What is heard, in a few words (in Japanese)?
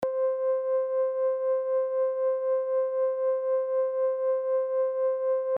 アンプLFO